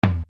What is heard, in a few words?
Casio RZ-1